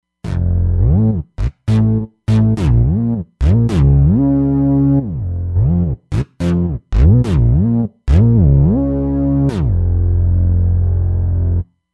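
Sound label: Saw Bass, Roland Fantom XR; SBF Saw Bs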